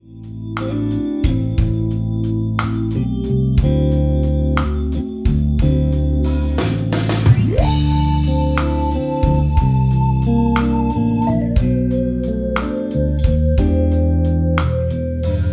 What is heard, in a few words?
Blues